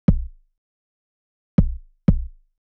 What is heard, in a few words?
Kick; hydrogen